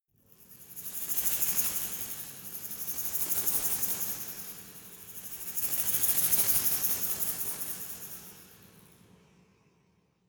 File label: resonator rattle dynamic